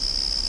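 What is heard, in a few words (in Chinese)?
蟋蟀; 红色警戒2; 环境音效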